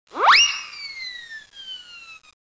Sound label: Zing